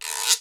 GUIRO OP, PERCUSSION; Crypton - DrumBase5000

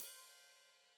Hat Foot Open, Hi-Hat; Deeply Sampled Drums

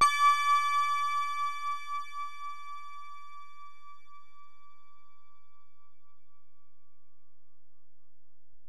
Bell, Alesis DM-PRO

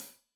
Hat Loose, Hi-Hat, Cymbals Stereo

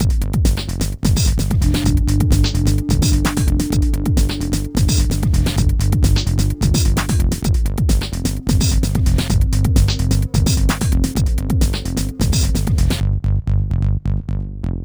Hardcore Breakbeat, 129 Cmin; Big Fish Audio; Hardcore Breakbeat Action

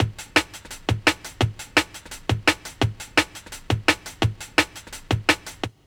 LOOP, Jungle

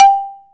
XYLO G 2; XYLOPHONES; AKAI S-Series CD-ROM Sound Library VOL-7

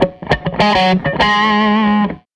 Tele Guitar Loop

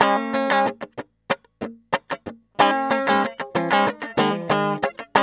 TELE DUAL D 3, guitar; TELE DUO; Spectrasonics; Hans Zimmer Guitars VOL-1

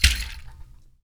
HAND, Afro Shakers, Ankle Pods; Roland LCDP14 Africa VOL-2

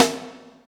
SNR BIG, Drums; AMG; Now CD-ROM (Roland); K.L.B. Kit 2

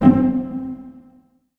Virtuoso Strings, TRM FX

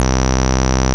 BAS, Eurotech; Sounds Good

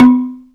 ZYLIMBA; Greytsounds VOL-1

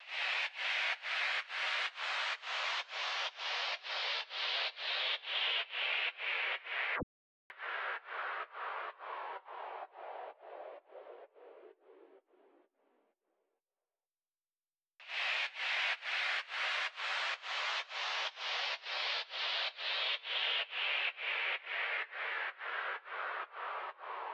Drum Loop 128BPM FX downlifter; VEC5; Drumloops; Multi Loops

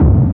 DR. LOOP, DRUMS; X-Static Goldmine 3

